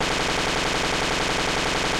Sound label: Instrument